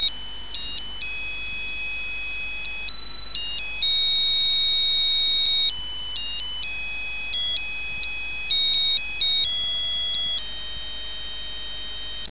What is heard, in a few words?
melody